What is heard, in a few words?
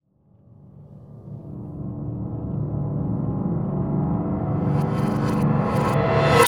Eerie Hallway, Cinematic Metal Impacts; RVRS